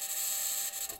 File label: mic, mars2020 edlcam